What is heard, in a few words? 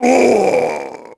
death; HADEAN; Pulse Racer